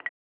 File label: tab Selection; IceCubesApp